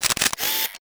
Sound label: Powerup Photo Trigger